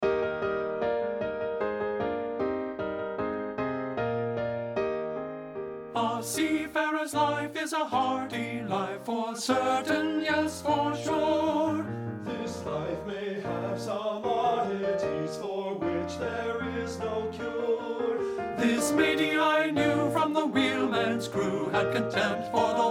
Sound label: TEN2; men; partbypart